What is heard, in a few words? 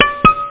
SPELL; 7realms